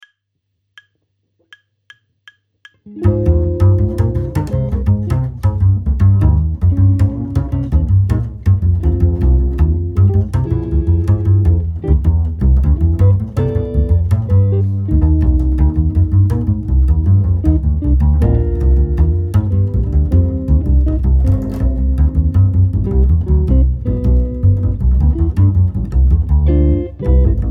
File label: Bebop, bass; Exercise 3